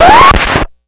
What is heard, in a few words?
Needle Drag, Vinyl Record Samples; Needle Noises